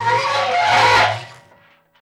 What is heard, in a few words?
Dry Ice Scream Mono, dry ice